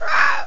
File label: raaaaa!; Amiga 8-bit Sampled Voice; Double-Click